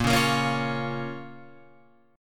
A sharp Minor, Guitar Open F; strummed; JGuitar

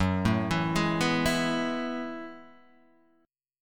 Gb Minor 11th Gb down Guitar Half Step Down

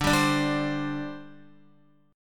D 7th Suspended 4th, down, Guitar; D7sus4 strummed

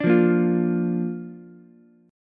A sharp Major, Ukulele; strummed; 7,5,6,8; chord; JGuitar

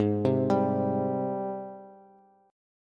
Ab Major 7th Ab down Bass; AbM7 arpeggiated; JGuitar

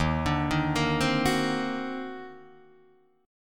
Eb Minor Major 13th, Guitar, DADGAD; EbmM13; arpeggiated

